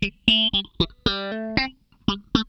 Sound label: FAZE PLUK, Funk Guitar; 90 s Sample CDs; Zero G